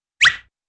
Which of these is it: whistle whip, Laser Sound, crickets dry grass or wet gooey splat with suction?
whistle whip